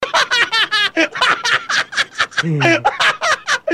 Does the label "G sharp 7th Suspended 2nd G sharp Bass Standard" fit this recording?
No